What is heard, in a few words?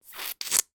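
cartoon squelch; zapsplat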